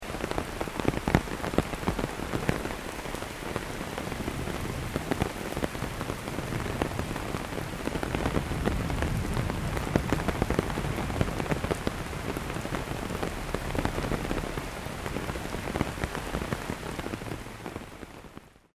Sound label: umbrella